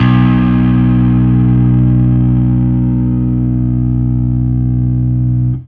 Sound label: Fuzz B3